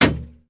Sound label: Capsulte Impact